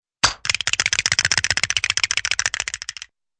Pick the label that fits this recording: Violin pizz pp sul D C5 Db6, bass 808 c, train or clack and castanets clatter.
clack and castanets clatter